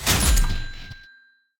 add Money; hellskitchen

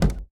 reelBounce; riches of midgard

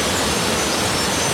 near Win; oceans treasure

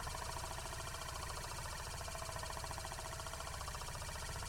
reel spin; spinsane

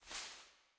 fairy Wing; fairyred

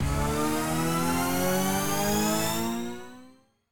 respin Snd, slots; king of slots

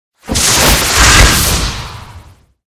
sym Transform; gorillakingdom

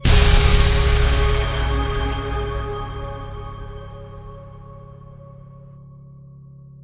outro Free Spin; halloweenjack

